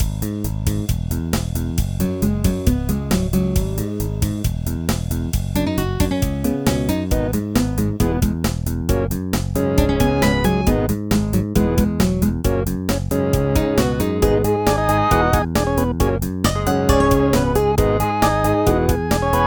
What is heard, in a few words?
Limbomusic rock2